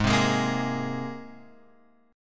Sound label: Ab Major Add 9th Ab, Guitar Open F; strummed